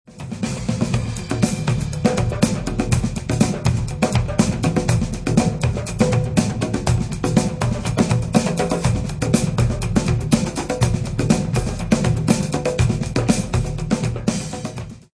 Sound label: Conga Groove